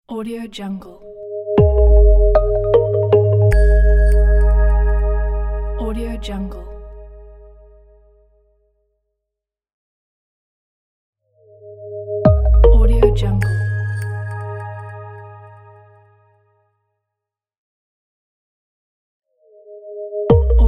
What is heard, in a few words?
simply logo